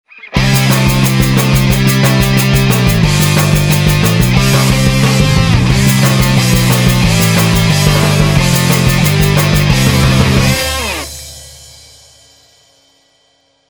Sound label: Timbre Rock Arrangement